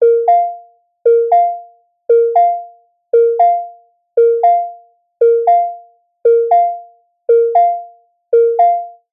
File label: hospital monitor beeps dull 2 tone